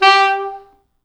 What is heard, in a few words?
G3, Pop Brass; Best Service ProSamples vol.19; AKAI